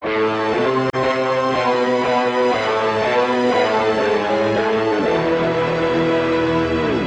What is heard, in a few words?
Lose; project reality bf2 1.6; ARG